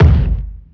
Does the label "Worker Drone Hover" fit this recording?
No